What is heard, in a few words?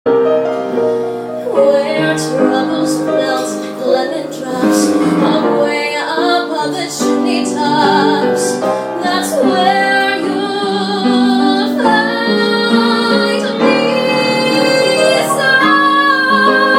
Singing